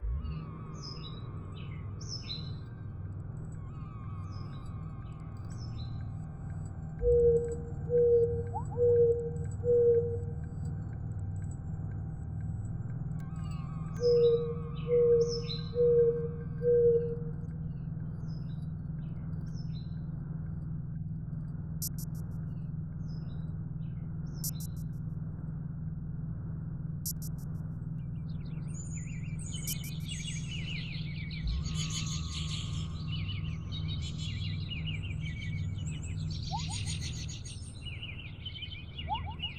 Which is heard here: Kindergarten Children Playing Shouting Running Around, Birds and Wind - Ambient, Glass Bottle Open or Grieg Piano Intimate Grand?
Birds and Wind - Ambient